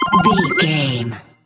collect item sparkle pop

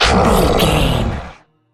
troll monster growl

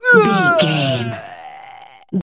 voice male grunt pain death

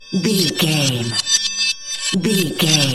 time warp reverse spell cast loop

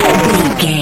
retro damage hurt ouch

